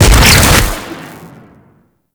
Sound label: Throwing Taser Sticky Bomb Explosion; Tenno